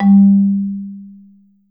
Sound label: MSOFT G2, Marimba; Sampleheads - Dave Samuels Marimba & Vibes